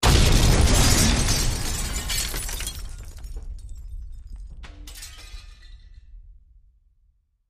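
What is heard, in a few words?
Explosion Glass Debris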